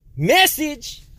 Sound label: Message Tone